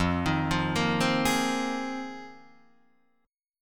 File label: F Minor 11th, Guitar Open D